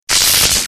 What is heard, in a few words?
spell failure